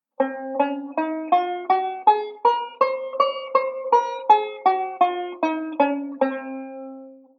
C Locrian, banjo; Beyond Chord Melody Volume II

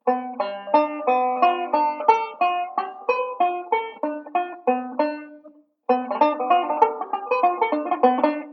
ii V Pattern, banjo

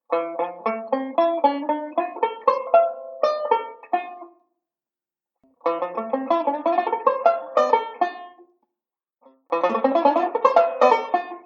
Octave Arp, banjo; Beyond Chord Melody Volume II

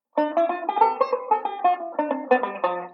Mixed Scale, banjo; Beyond Chord Melody Volume II

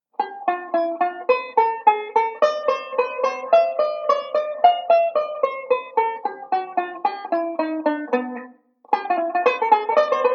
ii V Pattern, banjo

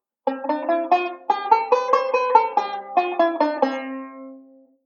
Delay Scale; Beyond Chord Melody Volume II

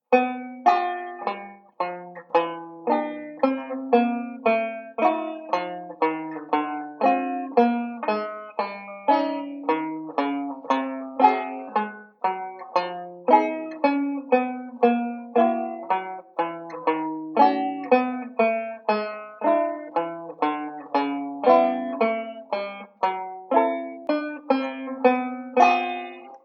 banjo; Beyond Chord Melody Volume II

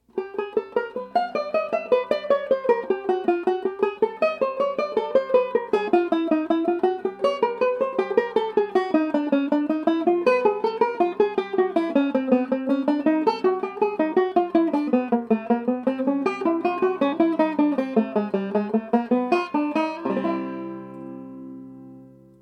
Circle Pattern, plectrum banjo, jazz patterns

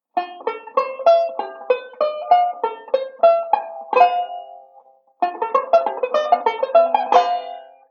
Passing Pattern, banjo; Beyond Chord Melody Volume II

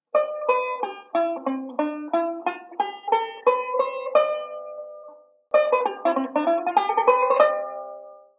Modal Sequence; Beyond Chord Melody Volume II

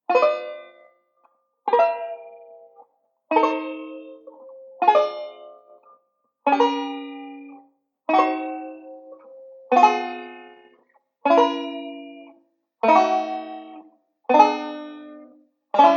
banjo; beyond chord melody volume ii

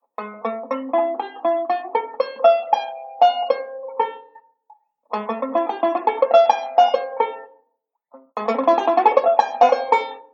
Octave Arp, banjo; Beyond Chord Melody Volume II

